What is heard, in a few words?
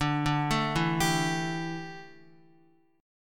D Suspended 2nd Suspended 4th D down Guitar; arpeggiated